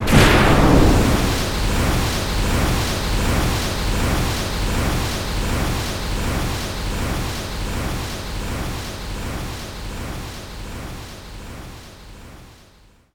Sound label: Bless Loop